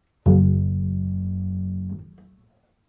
Double Bass F 1 Pizzicato Non Vibrato

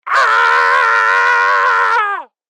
Scream; Tom And Jerry

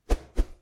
Swoosh Double

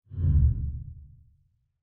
Transition Whoosh

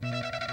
High Squeels

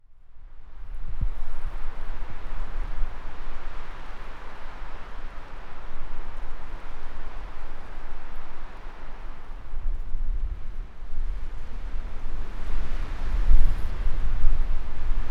Wind In The Trees

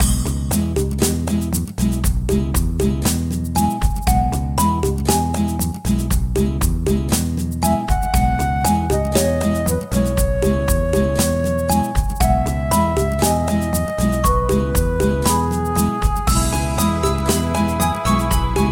Song Loop

